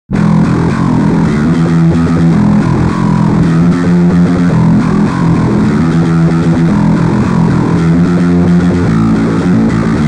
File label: Dirt Bass